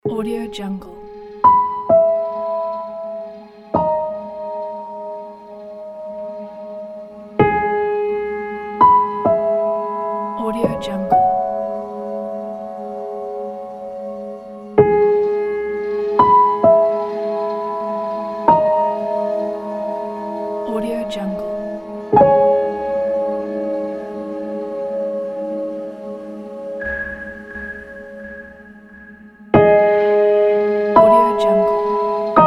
emotional dramatic ambience

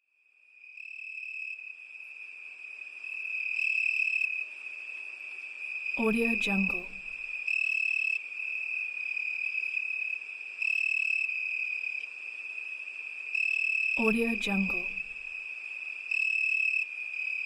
crickets

